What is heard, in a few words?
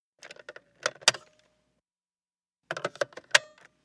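Car Phone Pick Up Hang Up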